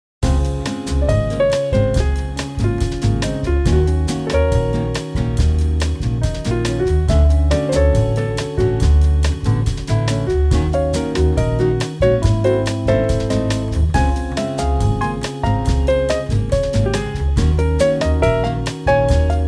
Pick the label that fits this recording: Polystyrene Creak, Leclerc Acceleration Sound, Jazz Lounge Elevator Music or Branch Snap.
Jazz Lounge Elevator Music